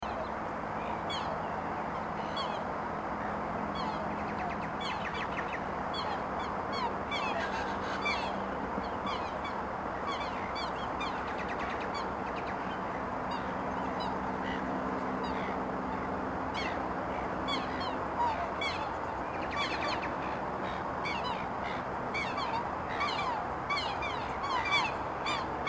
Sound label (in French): cygne noir cygnus atratus